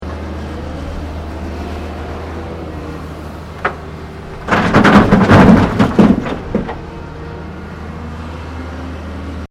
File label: Construction Earthmover Unloading Into Dumptruck; Various construction machinery